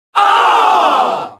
crowd shock

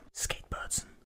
skateboard

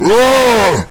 raaaaaaugh

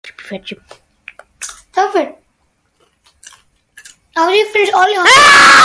epic scream

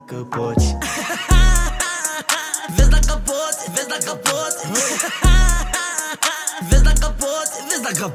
laugh

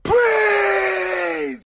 scream

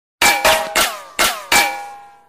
multiple ricochets

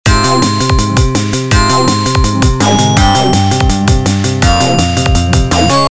ringtonus

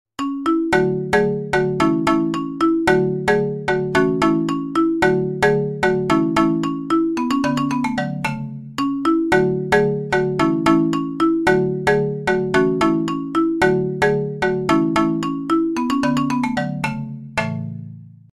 chime

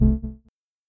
button sound

